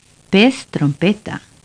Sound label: Sound trump